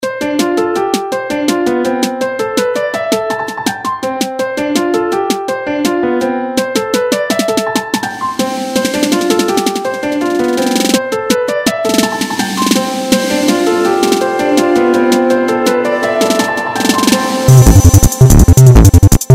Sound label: acid waltz